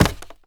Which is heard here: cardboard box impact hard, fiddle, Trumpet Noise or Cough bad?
cardboard box impact hard